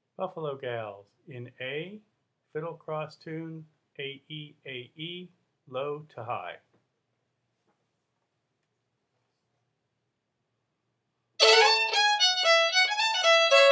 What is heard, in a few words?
in A, fiddle; festival tunes